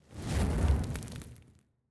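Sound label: Cult Fire swoosh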